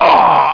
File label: pain